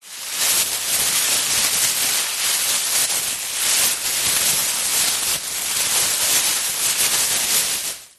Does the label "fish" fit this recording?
No